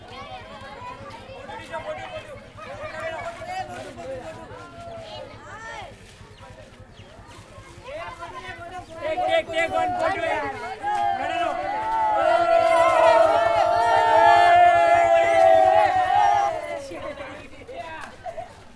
Kids Fooling Around Outside Shouting Ohhhh, India

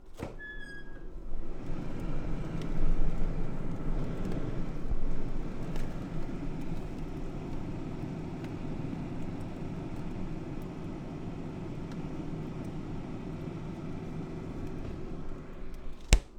Opening Freezer

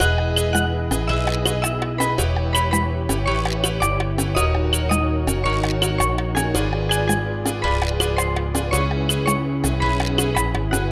Song Loop

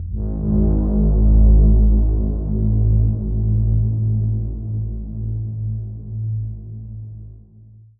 Steampipe Ghostwhistle G 3

